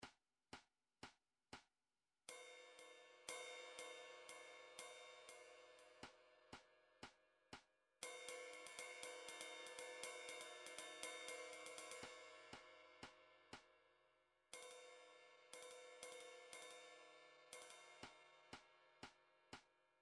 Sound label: ride; rumtrax library